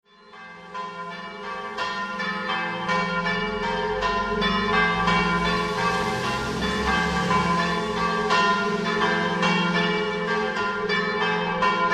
RODEANO ALTO, campane

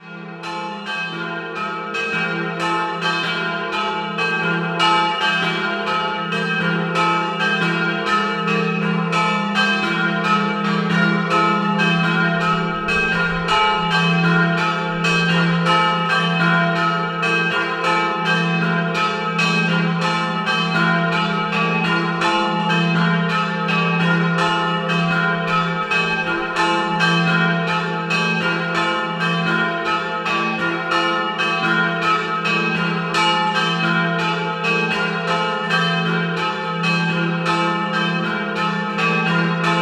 Castello di Aviano, campane

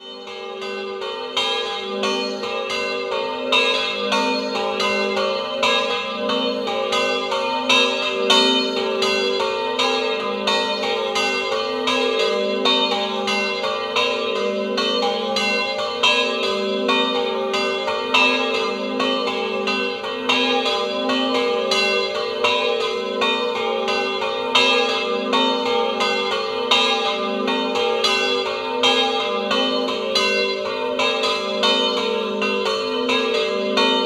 Pordenone, campane; Sant Agostino

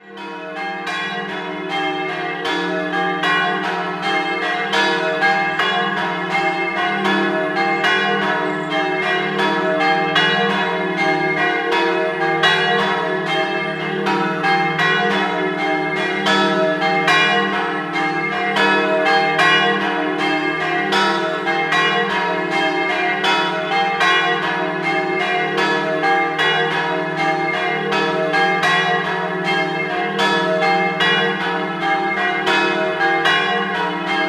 San Quirino, campane

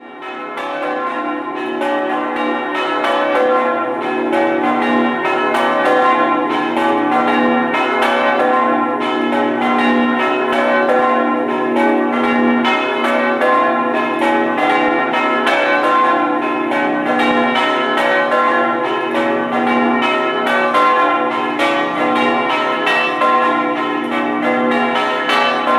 Spilimbergo, campane

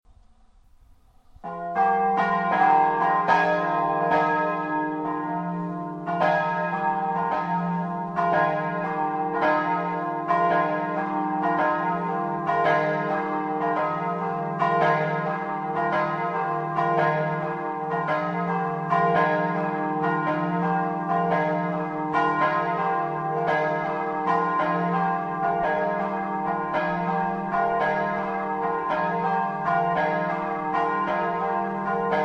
VERNASSO, campane

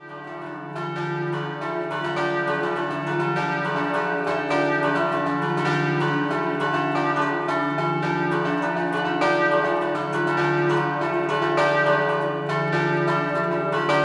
Scampanottata; campane

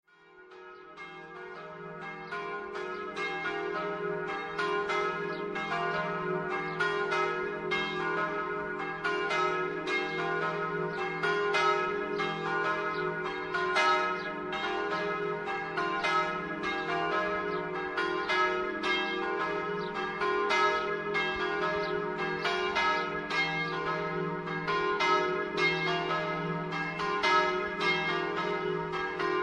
COLUGNA, campane

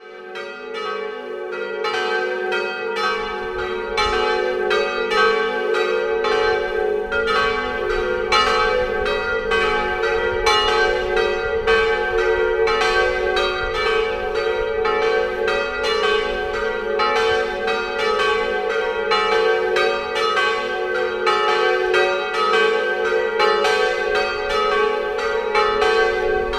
Aurava, campane

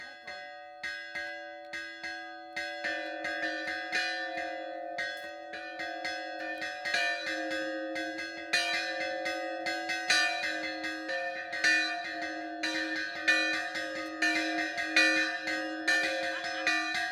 Priuso Scampanottata

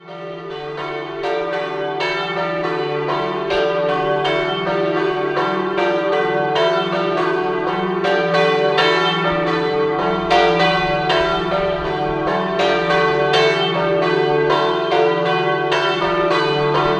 Malnisio, campane

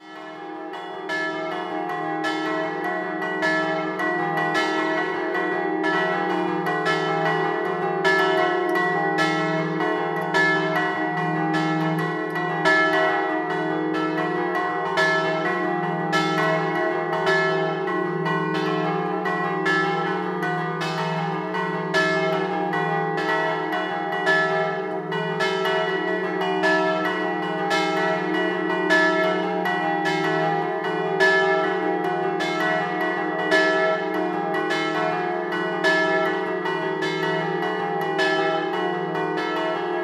Trava Scampanottata

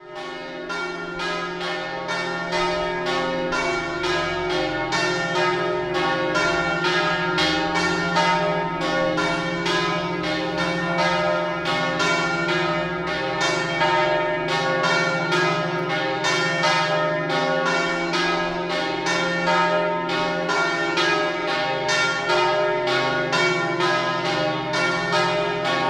Montereale, campane

